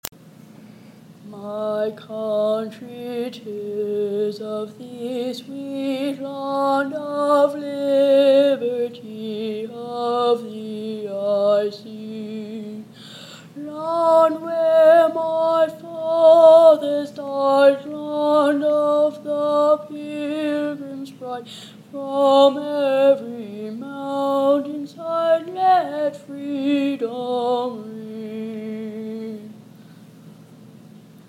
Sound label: Honor Choir Audition; TCDA (a state-wide choir) - Spring 2013